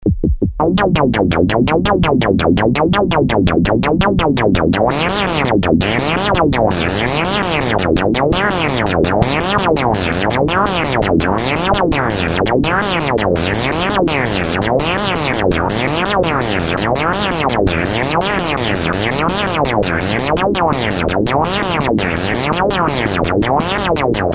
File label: moog noise